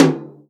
tom, Real Drum Kit, jungle kit

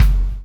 CHAIN; Spectrasonics - Backbeat

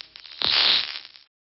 fx interferance

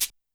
Cabasa; YAMAHA RM1X

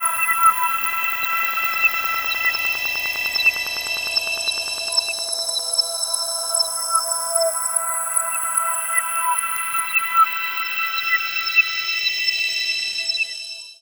Star Sparkles, Ambient; Zero-G Seismic Frequencies; 90 s Sample CDs

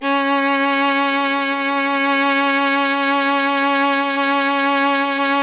viola C; Miroslav Vitous; Solo Instruments VOL-1

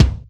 Roland R-5 Double Head Kick

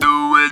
"do it", Talk Box

